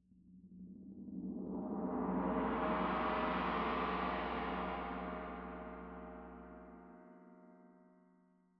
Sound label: Tam-Tam Close